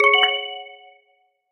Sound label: notification